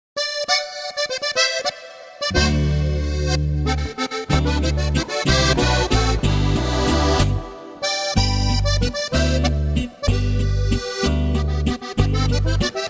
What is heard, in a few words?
taxach walzer